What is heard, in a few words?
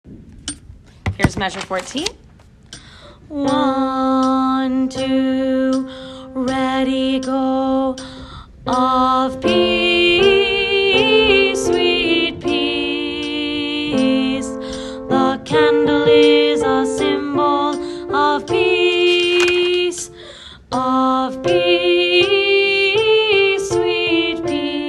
candle is a symbol alto